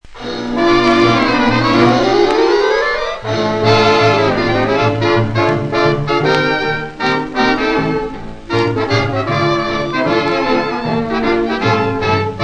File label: British Dance